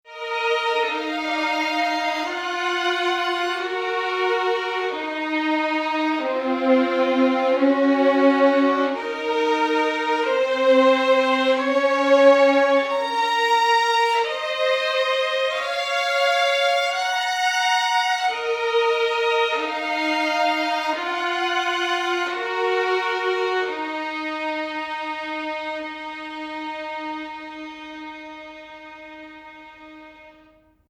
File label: violin